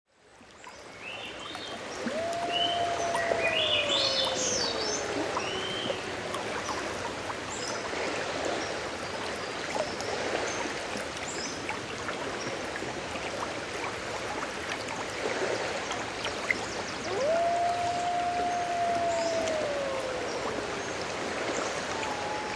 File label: whales wolves and eagles of glacier bay